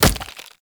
Wood Flesh Impact; Weapon Impacts; Player

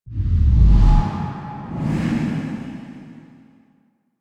Menu Close; Cinematics; Drifter; Warframe; Duviri Quest; Courtyard

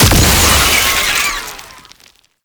Infested Spore Pistol Explosion

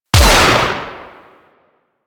Heavy Shotgun Alt Shot; Weapons; Warframe; Tenno

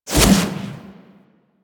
Melee Jump Slam Charge, Whooshes; Weapons; Warframe

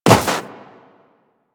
Run Snow, Footsteps; DE; Warframe; Tenno

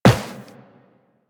Run Metal, Footsteps; Warframe; Tenno

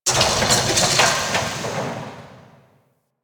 distant machines; Warframe; Corpus Railjack; Ambience

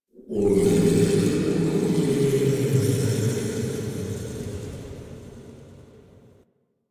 interior texture gurgle; Ambience; Misc Indoor; DE; Warframe; Infested Eris

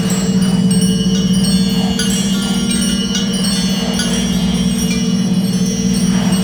Idol Loop; Pazuul; Warframe; Quests; Veilbreaker

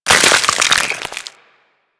Ice Freeze Hit Effect; Warframe

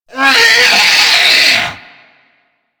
Zombie Attack, Vocals; Mariner Zombie

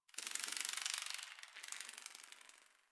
Insect Day

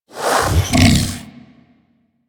Vocal Breathe Fast

